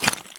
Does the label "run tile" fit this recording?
Yes